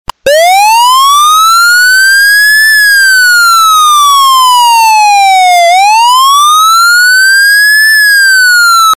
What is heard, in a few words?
Wail